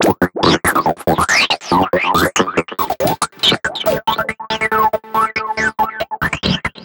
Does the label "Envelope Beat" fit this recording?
Yes